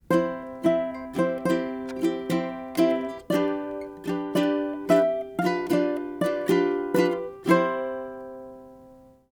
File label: Ukelele Accompaniment